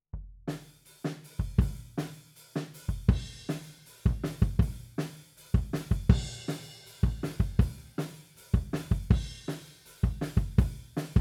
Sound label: Kick Out, 160 BPM